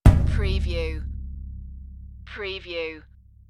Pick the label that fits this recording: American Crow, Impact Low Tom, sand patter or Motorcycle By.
Impact Low Tom